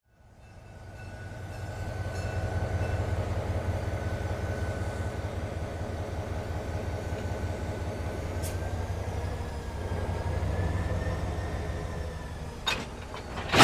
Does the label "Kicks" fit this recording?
No